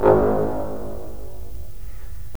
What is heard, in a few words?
BRS, Roland LA Composer